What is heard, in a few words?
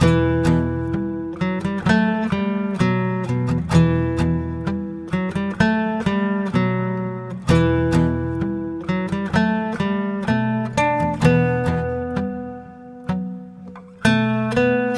guitar, Jazzmatazz loops